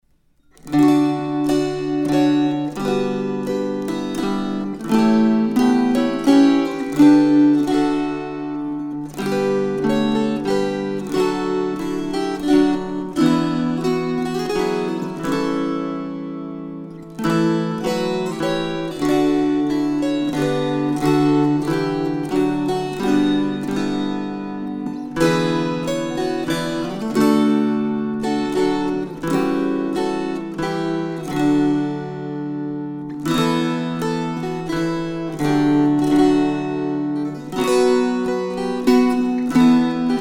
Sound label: String Choir